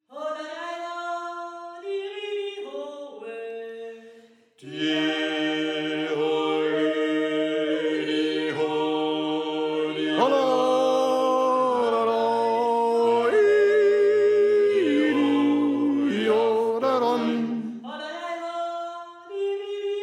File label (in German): Steirer Tenor